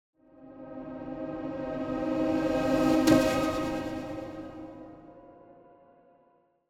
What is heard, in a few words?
Symphonic Hit Reversed And Pulsating Pizzicato; Hollywood Edge; sound designer's toolkit v2